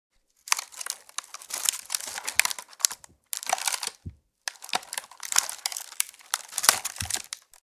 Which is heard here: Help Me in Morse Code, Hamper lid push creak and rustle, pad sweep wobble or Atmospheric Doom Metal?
Hamper lid push creak and rustle